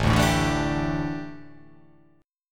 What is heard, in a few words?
A 7th Suspended 4th, A, down